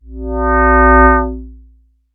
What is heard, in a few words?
Portal